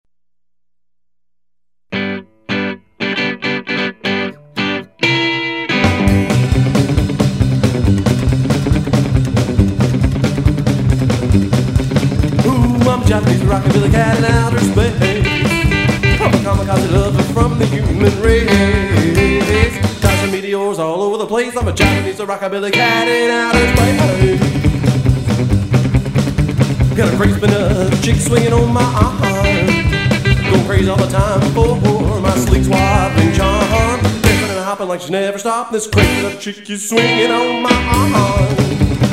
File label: Japanese Rockabilly